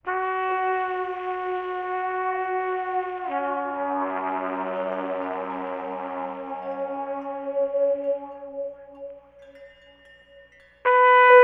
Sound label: trumpet flourishes